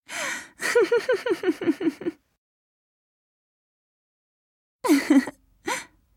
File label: laugh; Ahri